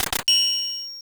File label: spinner bonus; Kill La Kill